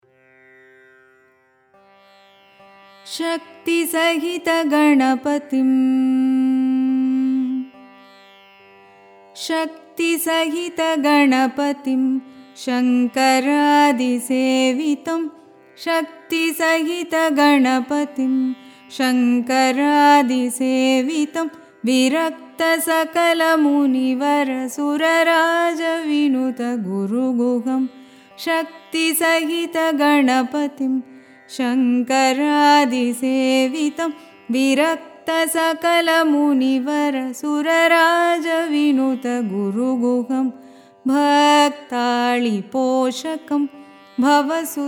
song shankarabaranam adi tisra nadai, carnatic music; shakti sahita ganapathim; dikshitar